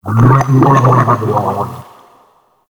Large Attack; Warframe; Shadowgrapher

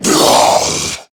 Death, Male

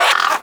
Bird Tranq Dart Grunt; Hunting Critters; Sawgaw

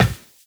Run Glass, Footsteps; Warframe; Tenno

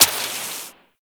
Water Sprayer Mech; Warframe; Weapons

